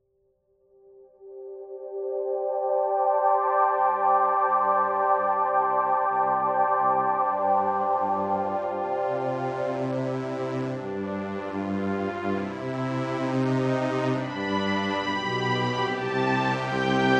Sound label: ARP Solina